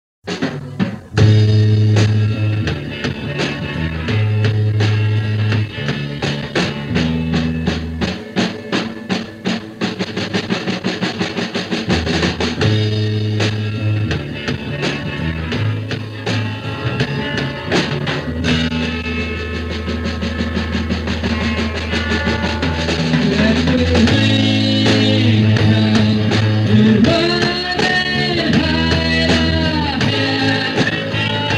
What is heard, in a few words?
rain